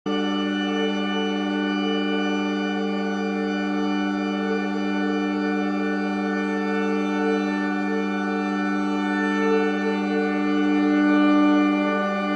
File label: tone